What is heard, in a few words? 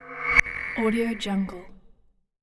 horror reverse